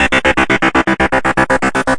persistant synth melody, synth and electronic loops